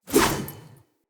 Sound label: Spear Throw; Weapons; Player